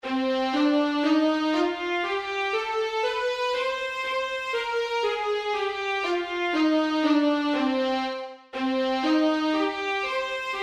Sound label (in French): mineur melodique; faites vos gammes